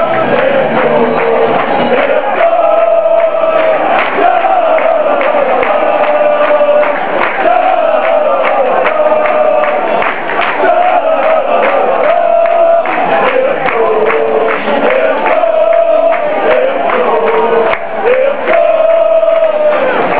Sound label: shalalalaa